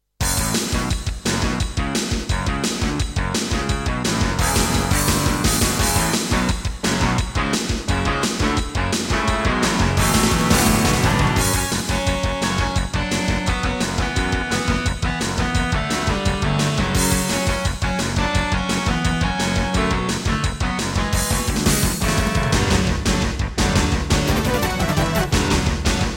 Roland PMA-5; Duke Nukem 3D

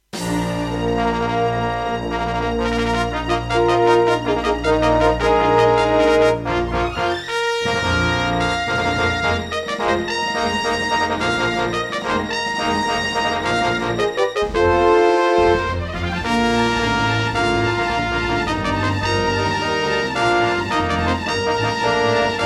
Intro, Serdaco MP32L GeneralUser GS Bank; Dark Forces

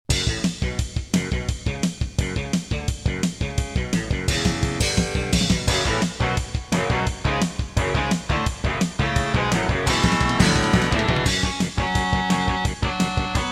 Intro, Casio GZ50M; Duke Nukem 3D

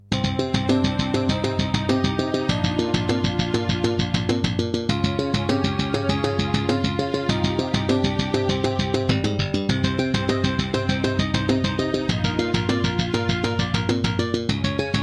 Diamond Monster Sound 2MB; Hocus Pocus

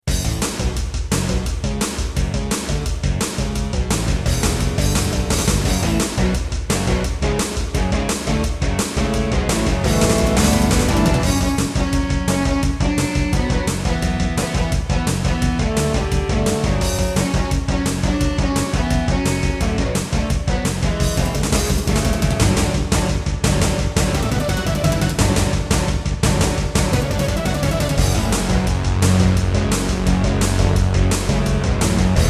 Intro, Yamaha MU2000EX GS MODE; Duke Nukem 3D

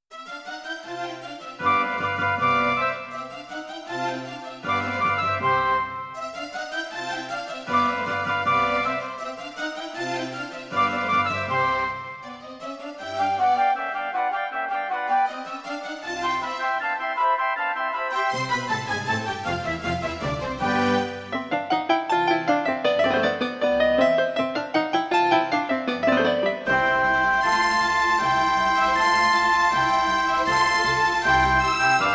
Kawai GMega; classical; Mozart; Concerto K467 partial

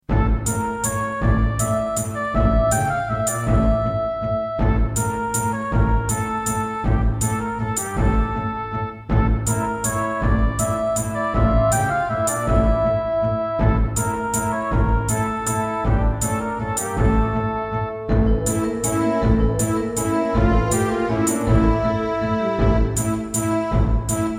Yamaha MU2000EX GS MODE

